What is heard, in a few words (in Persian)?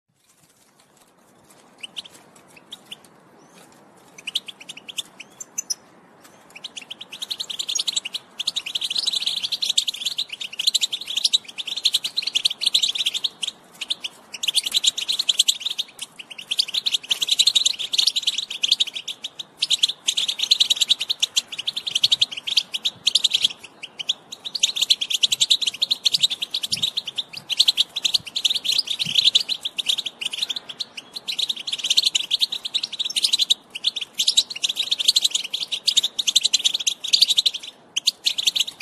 sedaye tahrik sohreh